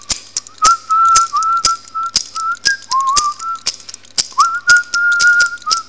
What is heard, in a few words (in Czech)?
rolničky